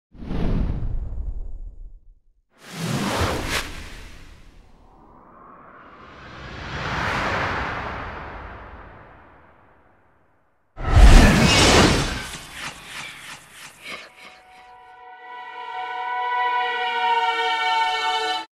Eerie Whoosh Transitions

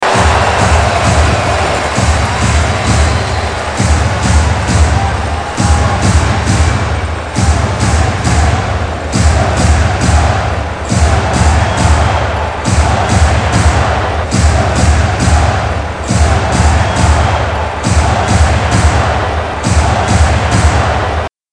DRUM

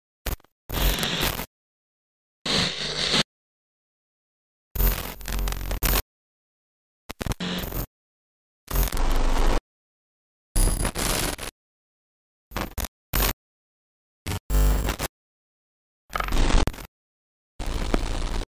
GLITCH

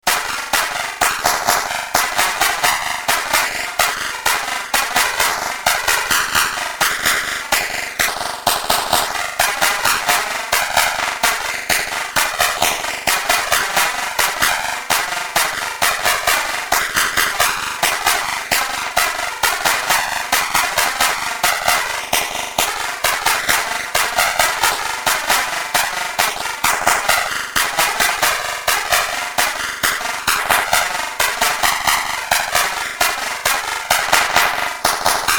DRUM klasika

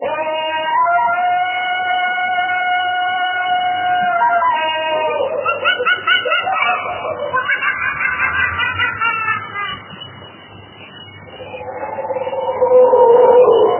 Jungle Sounds

